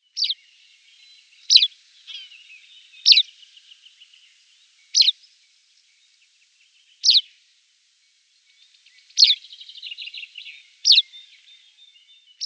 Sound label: Tawny Pipit call